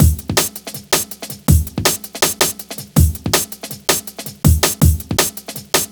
162, breaks; m8 breaks

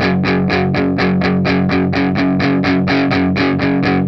Vintage Blues Guitar, RYTHM 125; USB Soundscan vol.22; AKAI

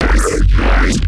Growl Bass; neuro; BIG5AUDIO

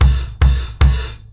KICK HAT, Live Snares; PlatinumPhattCD1; E-MU Producer Series Vol. 8 – Platinum Phatt

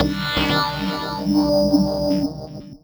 Synth Hits, D3; Zero-G; Soundclash Nu Dance Electro; Acidized

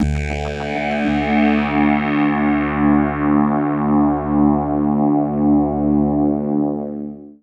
Sweep Drone D; Trance Formation

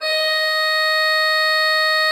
D5, Cathedral Organ; ILIO; Propeller Islands

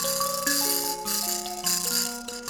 PLK Bush Mbira

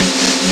A SN, DRUMS; Analogon

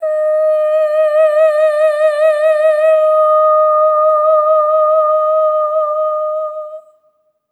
EH OH D#5, SOP; Spectrasonics; Symphony of Voices

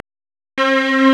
STRNG; CMP - Analogon

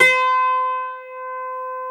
CIST C 5, cister; SoundBytes; Early Patches

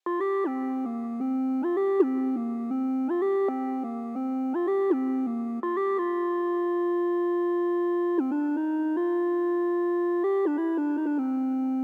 lead, Smooth RnB; 081 Cmin

